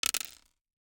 DICE on Hard Wood, Throw and Roll, Mini, 3 Three Dice; Samples; ArticulatedSounds; DICE Sfx Party (Extended)